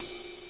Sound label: rid cym, midi